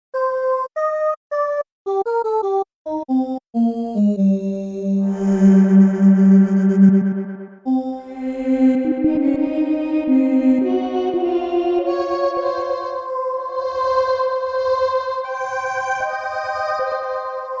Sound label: Waldorf Quantum